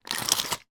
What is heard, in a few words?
medical medicine foil blister pack remove from box